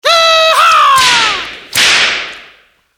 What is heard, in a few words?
Yeehaw; Orphaned